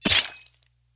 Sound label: skill ninja sword imp